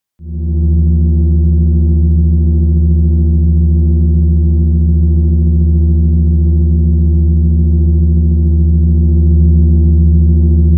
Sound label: Hum Hum Constant Thro, buzz